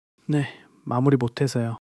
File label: struggle